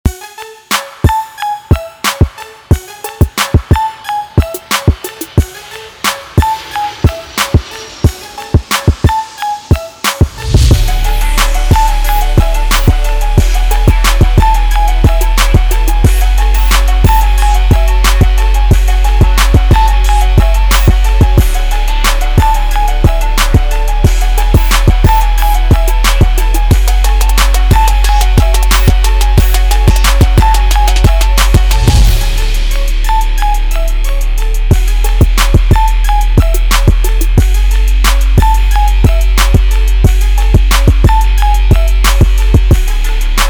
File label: instrumental